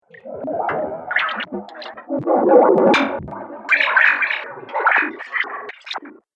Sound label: Alien Robot Noises Various